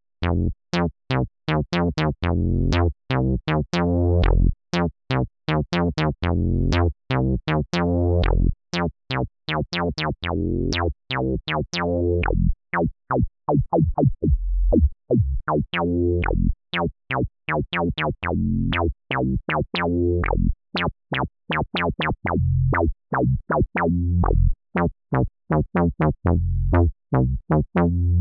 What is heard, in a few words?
Monosynth